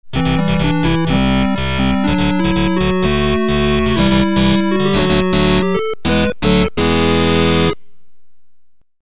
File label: Stage Clear